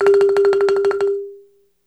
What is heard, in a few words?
BALAFON G; World Combos; African Melodic; E-MU Producer Series Vol. 7 – Old World Instruments